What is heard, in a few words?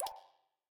Dialogue Next; Game rip; MiSide